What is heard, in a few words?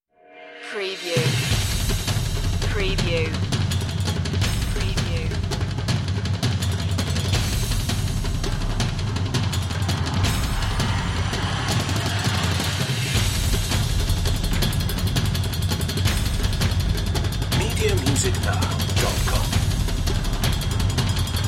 percussion